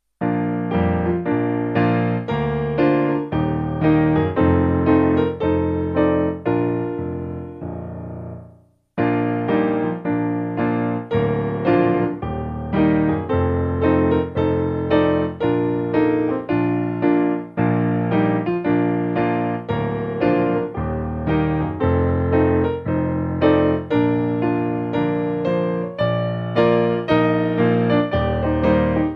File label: Piano; ComeToTheSaviour